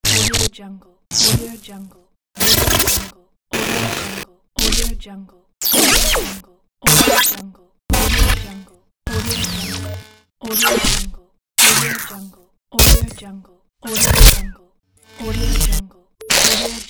short glitch pack

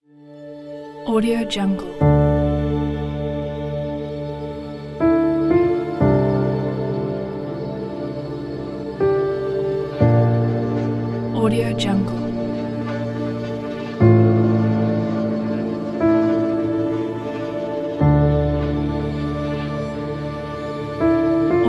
suspense intro

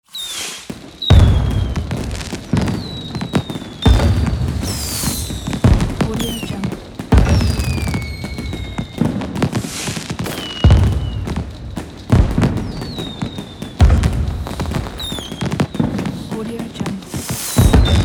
a firework; sfxroom

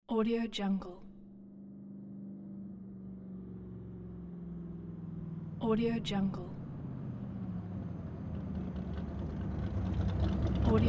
v8 engine stop

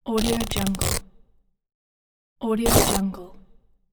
clothing ripping

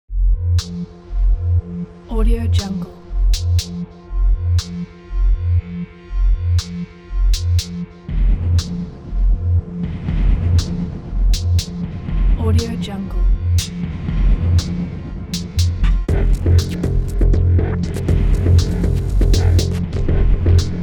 dark cinematic horror music

